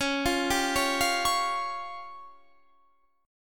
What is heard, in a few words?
C sharp Major Flat 5th, Guitar Standard; arpeggiated; chord; JGuitar